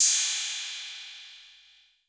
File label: 808, CYMBAL; Wizoo - Platinum 24 Electronic